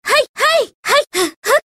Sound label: hit; kasumi